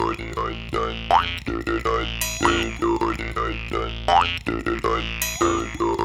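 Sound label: 80 bpm, Boing; Spectrasonics - Supreme Beats - World Dance; Ben Boing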